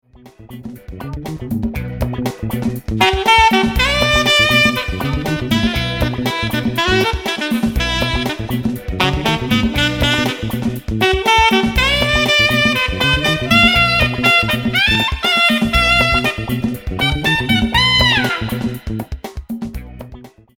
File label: Funk Alto; MarmadukeSC